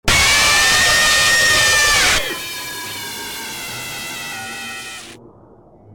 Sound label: jumpscare sound effect; fnaf plus v5